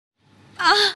ahh; jacqueline fernandez; audio meme

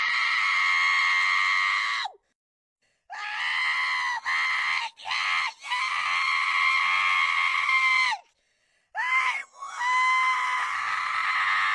screaming; tiny chef; green screen; audio meme

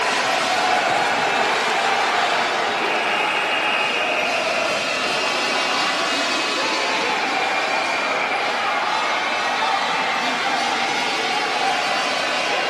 zombie playing soccer; green screen; audio meme

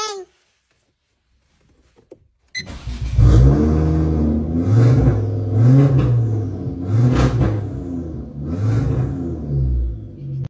baby reaction; audio meme

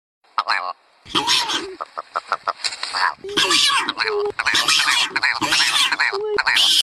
hyrax and chicken talking; audio meme

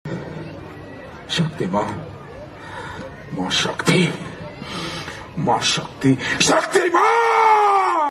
chanting shakti maa; sunil shetty; audio meme

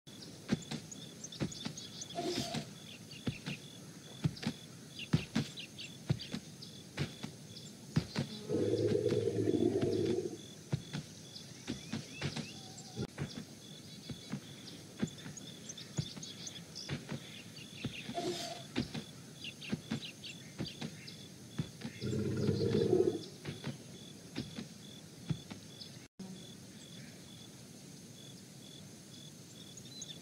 elephant; audio meme

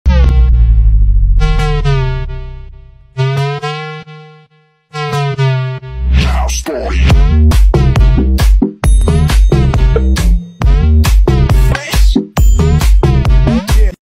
sad cat dance; audio meme

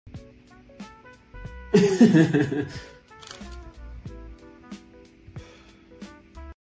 fake office laugh; audio meme

